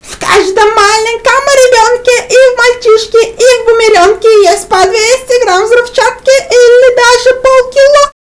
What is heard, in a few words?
song